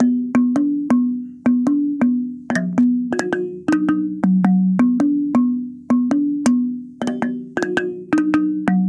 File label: Tongue Drum, African; Sonic Foundry (Sony Creative Software); Continental Drift Aftershocks